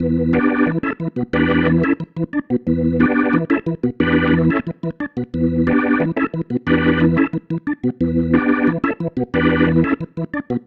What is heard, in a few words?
Pattern, Organ